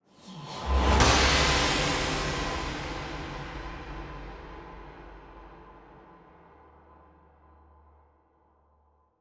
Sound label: Impact